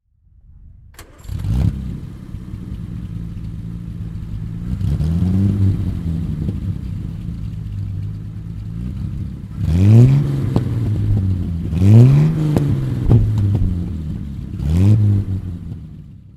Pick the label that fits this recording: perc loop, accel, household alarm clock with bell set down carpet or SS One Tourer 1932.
SS One Tourer 1932